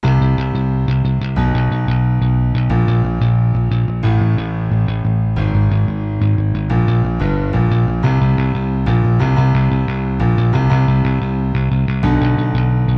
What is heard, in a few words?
chorus bass